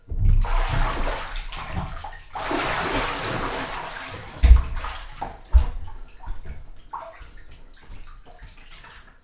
get out of bath

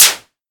hitting; attack

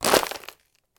trample PET

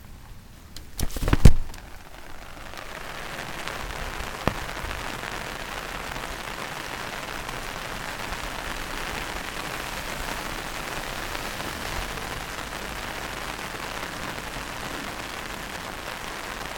umbrella rain

